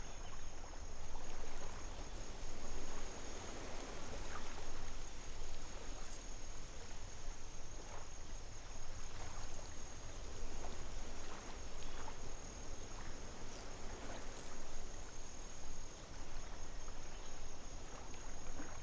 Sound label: outdoor lake night; anemoi